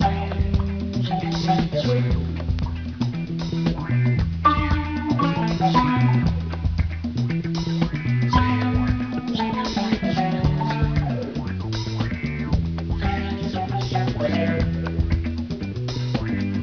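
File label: decore muzak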